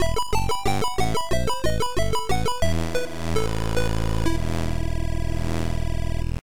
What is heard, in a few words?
Game Over; Castlevania cover